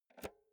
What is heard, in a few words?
insert jack multi socket quick